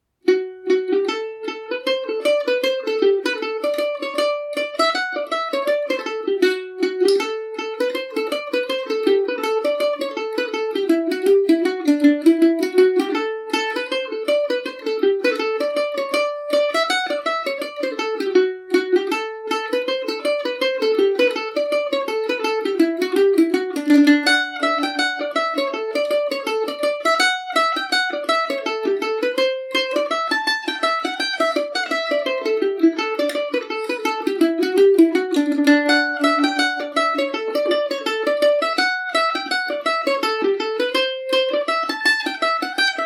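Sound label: reel, D mixolydian, swing, irish mandolin; colliers reel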